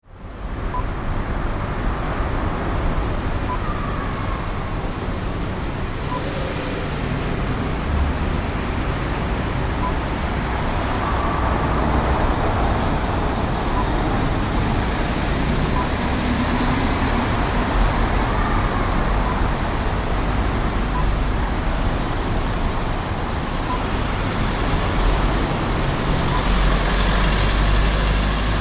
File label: Kingston Car Park